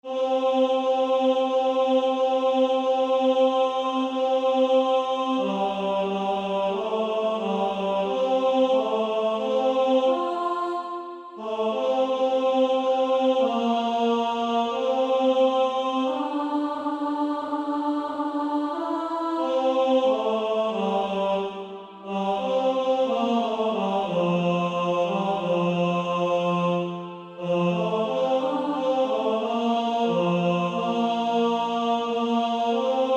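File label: Female Choir, alto; Onward Christian Soldiers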